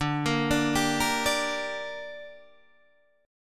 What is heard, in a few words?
D Suspended 4th Sharp 5th D Guitar Standard; 10,13,12,12,11,10; arpeggiated